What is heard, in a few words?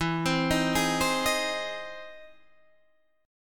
E Augmented Major 7th E down Guitar DADGAD